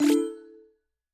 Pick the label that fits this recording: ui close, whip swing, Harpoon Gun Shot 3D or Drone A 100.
ui close